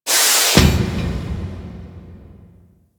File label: Organ Pipe Close; Ambience; Orokin Moon; Warframe